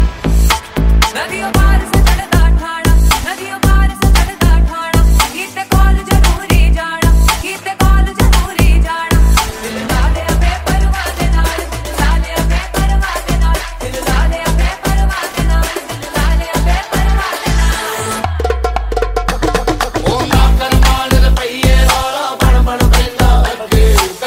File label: ringtone bollywood ringtones